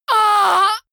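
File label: female combat hit hard, screams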